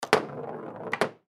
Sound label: Foosball Table; Foosball Table Ball Insert Start Slot Roll Slow; Sports